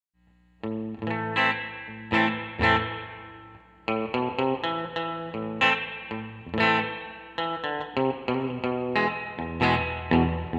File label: reverb no overdrive